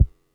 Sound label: kicks